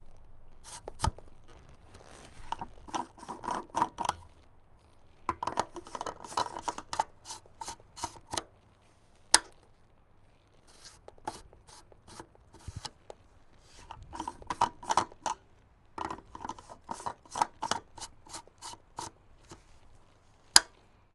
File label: harley davidson softail gas tank lid cap on and off; Sonniss GDC